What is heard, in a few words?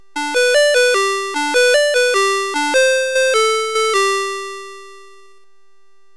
SID6581; Hemglass tutan